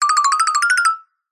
cartoon footsteps fast scurry scarper mallets

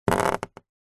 nature water drips sudden short cardboard

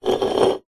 food drink can soda empty slide short table

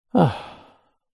human male sigh satisfied exhale

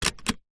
car seatbelt buckle release toyota rav4; vehicles

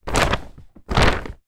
twister game sheet gentle movements x2

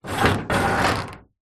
office filing cabinet old metal handle turn

